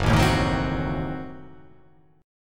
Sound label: F sharp Diminished 7th F sharp